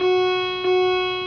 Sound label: klaxon loop